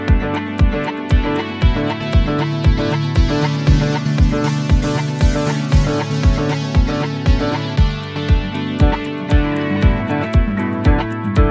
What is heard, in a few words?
ringtone bollywood ringtones